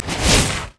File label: qspellbounce; LoL; ziggs snowday